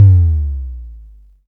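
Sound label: Analog Kick, dry kicks; Quasimidi Rave-o-lution 309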